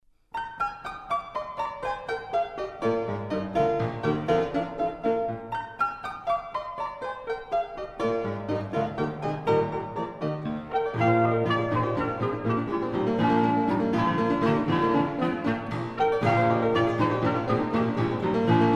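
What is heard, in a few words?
clarinet trio; eberl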